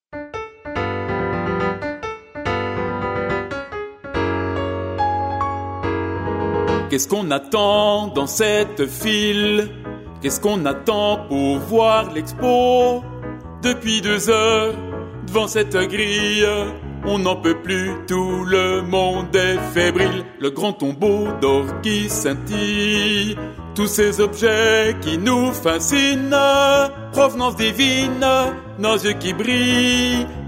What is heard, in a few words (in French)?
SUB BARYTON